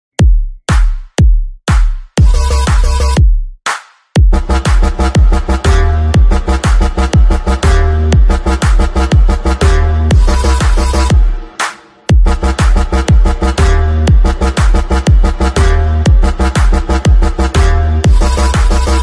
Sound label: animal bgm animal ringtone